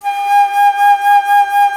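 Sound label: flute, alto flute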